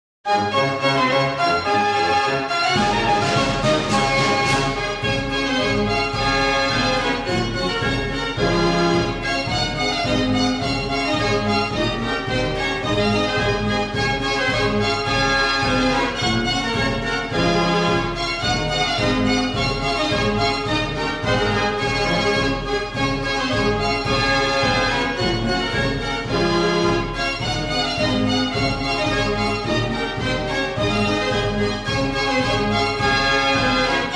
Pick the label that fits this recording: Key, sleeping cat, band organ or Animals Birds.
band organ